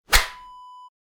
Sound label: whip whoosh